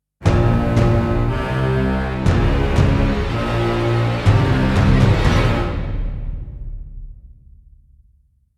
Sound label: Epic Orchestral Stinger